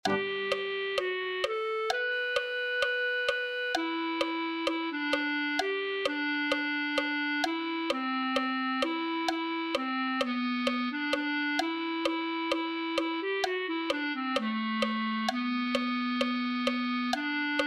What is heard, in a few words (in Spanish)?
clarinete1